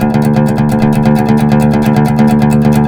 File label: PLK OUD, Roland LA Composer